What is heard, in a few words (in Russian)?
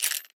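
snapping of a climbing carabiner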